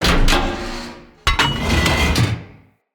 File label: Siege Engine Cannon Warning; Weapons; Enemy; Artillery; Ode Siege Engine